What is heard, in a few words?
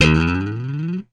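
slap, bass; Xsample VOL-1; SLAP+THUMB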